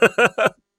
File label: emote laugh; Multiplayer